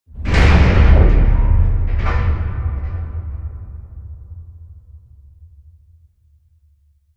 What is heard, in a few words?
promo pad; whooshes rises; hits impacts